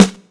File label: smooth bottom end acoustic snare g key; snare drum samples